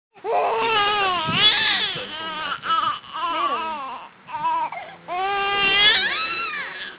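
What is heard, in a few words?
baby hungry, female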